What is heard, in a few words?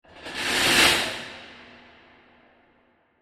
Debris Metal Transition; Scary Soundscapes; Horror; Crafted Signals